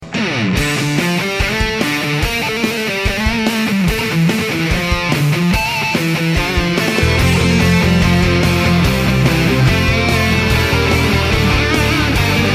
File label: metal